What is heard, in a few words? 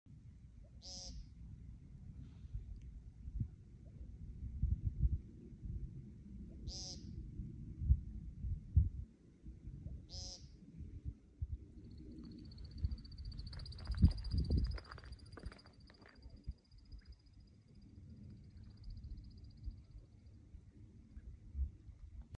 Woodcock